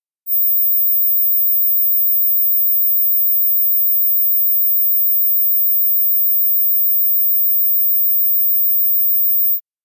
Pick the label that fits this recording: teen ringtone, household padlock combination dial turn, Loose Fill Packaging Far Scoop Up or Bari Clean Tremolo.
teen ringtone